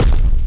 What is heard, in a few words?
curved step